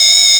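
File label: ATTENTION, SIGNALS; AKAI Anime Sound FX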